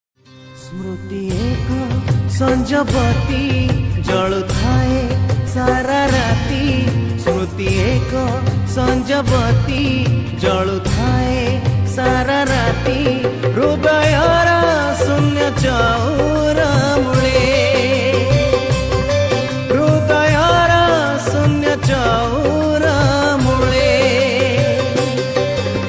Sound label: odia bhajan